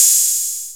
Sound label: HT OPEN, DRUMS; Kid Nepro; Elite